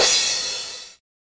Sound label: nightcore finish; osu